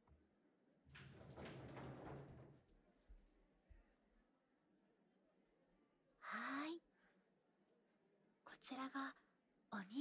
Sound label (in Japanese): そのお耳……とても敏感そうですね（右耳ふーふー